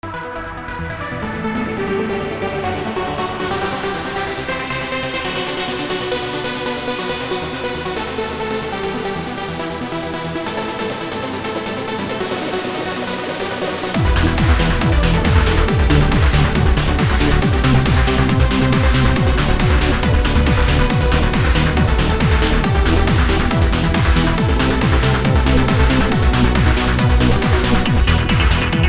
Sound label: trance